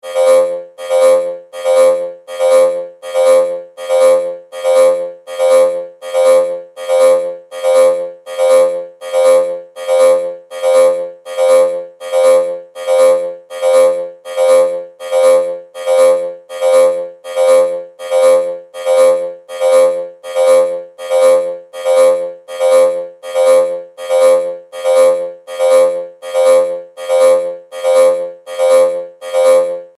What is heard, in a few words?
Alarm Robotic, Scifi, Alarm, Robotic, Science, Fiction; Tech Sound Effects